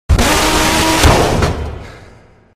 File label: upscale